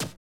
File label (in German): Treffer